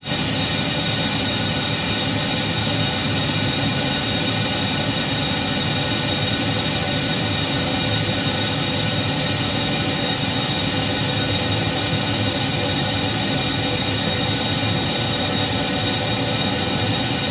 Simulation Of Industrial Noise With High Freq Content